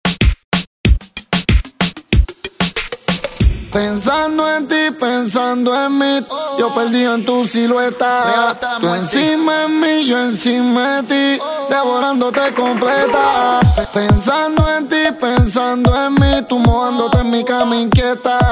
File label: Extended 94 Bpm; DJDOLLS